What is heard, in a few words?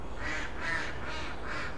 Ducks, Birds